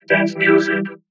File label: Dance music